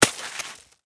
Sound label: ball snow base walk